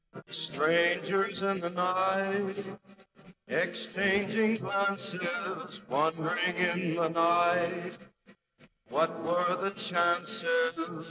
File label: voice